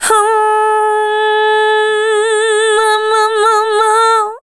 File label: Dance Vocals, FEMALE